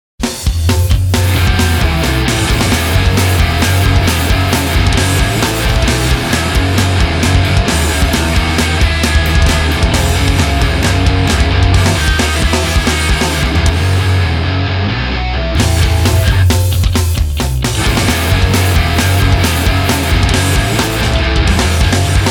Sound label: Punk